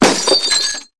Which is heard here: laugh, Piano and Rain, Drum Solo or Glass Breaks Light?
Glass Breaks Light